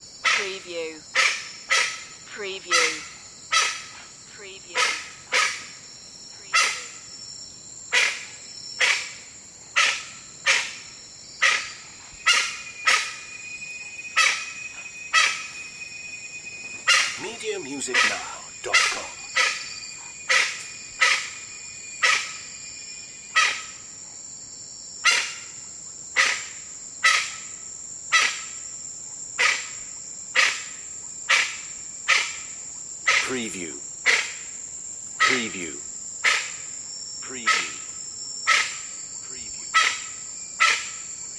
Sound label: crazy jungle bird